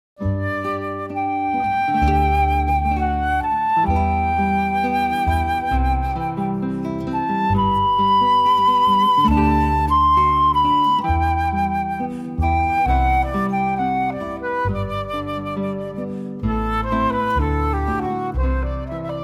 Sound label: guitar flute bass trio